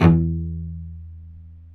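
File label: E1 SPICCATO, CELLO; Northstar; New Gold VOL-2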